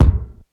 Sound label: kick sound c key